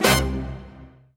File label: Combo Paper Hit; Party Crasher